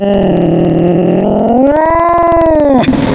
Cat, Wavetables

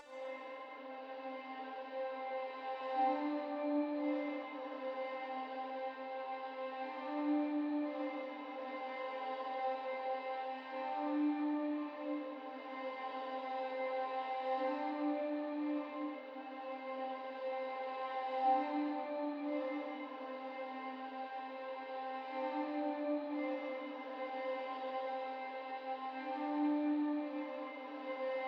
124 BPM C Min Pad; Cymatics; Destiny Gold Expansion; Mount Fuji

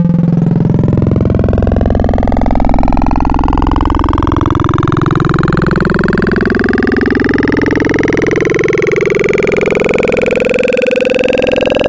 Triangle Formant, Wavetables; Cymatics; Wavetables Collection Vol 3; Serum

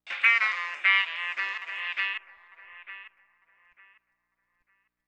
Stab; Thirty-One Flavors

